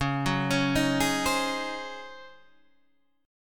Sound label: Db Major 7th Suspended 2nd Suspended 4th, Db, Guitar, Standard; 9,9,11,8,9,8; DbM7sus2sus4 arpeggiated; JGuitar